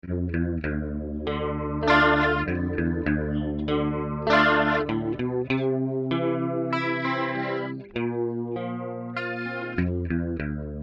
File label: Clean Leslie Modulation